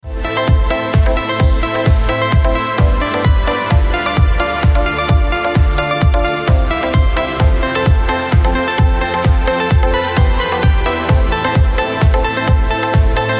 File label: Is Calling RNB